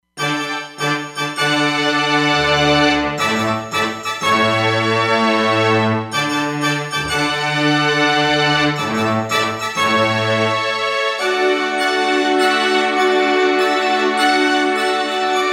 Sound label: Pop Orch, Roland Fantom XR